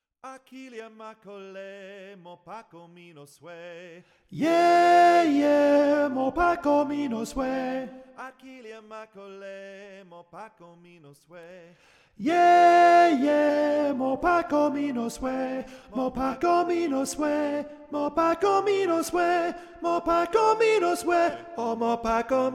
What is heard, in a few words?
alto